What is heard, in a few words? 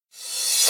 Dr reverse